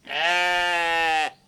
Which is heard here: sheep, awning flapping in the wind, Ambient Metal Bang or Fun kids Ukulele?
sheep